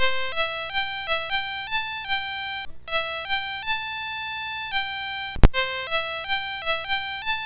Commodore BASIC, MOS6581R3; Commodore Users Guide NTSC

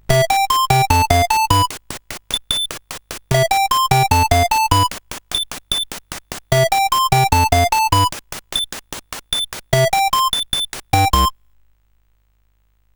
sid, CSG8580R5; Coco-Notes

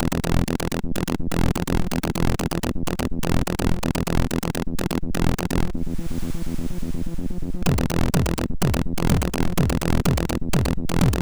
Erotic Techno, MOS6581R4

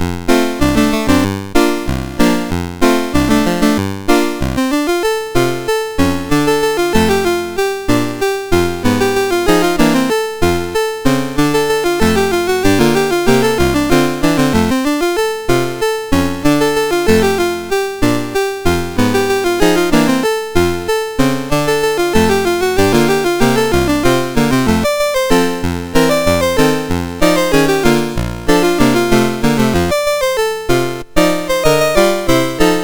MOS6581R2

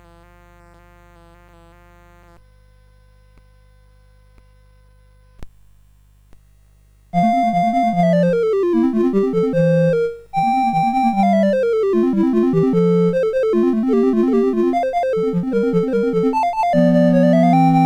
Sonatina in F, CSG8580R5

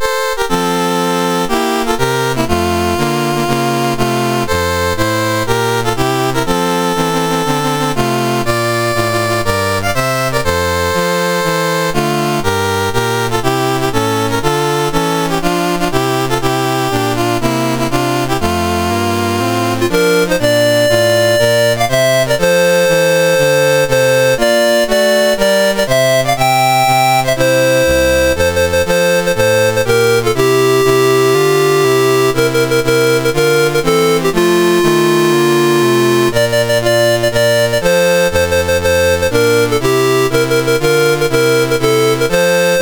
MOS6581R4, National Anthems; The Comoros

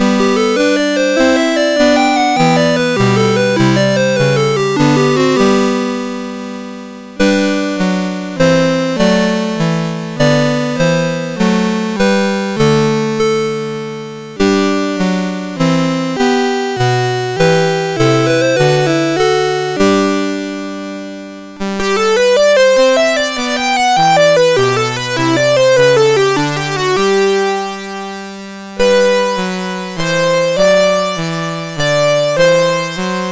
Jesu Joy of Mans Desiring, MOS6581R3

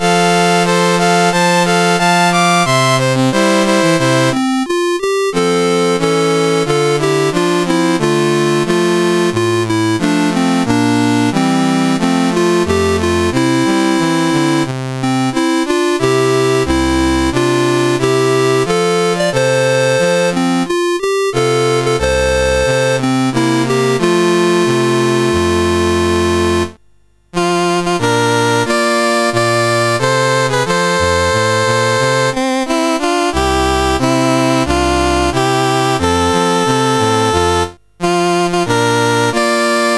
Singapore, National Anthems, CSG 8580; CSG8580R5

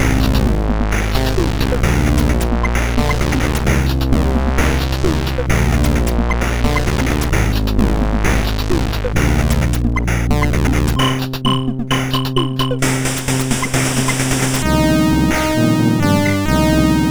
sid, MOS6581R4; Bozuma

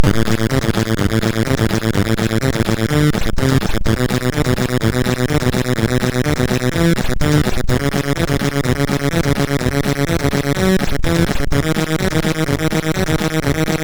sid MOS6581R2

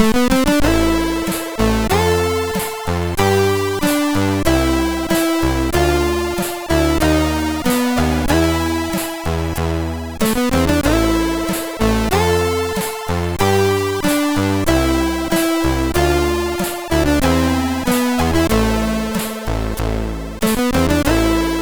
sid MOS6581R3

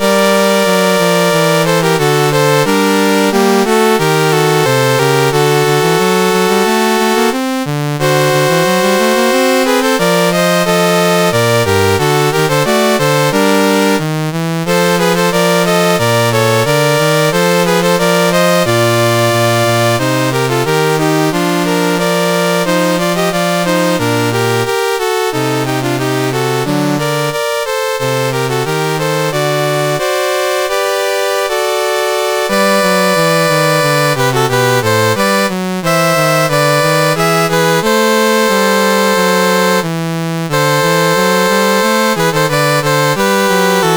CSG8580R5, National Anthems; Puerto Rico

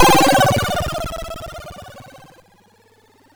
sid MOS6581R4

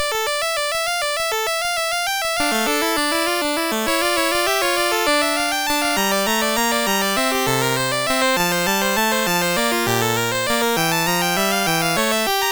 Allegro in D Minor, MOS6581R3

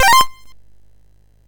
sid MOS6581R2; California Games

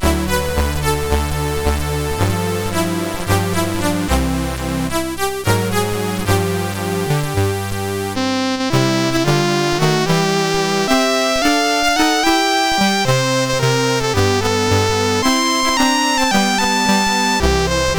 CSG8580R5

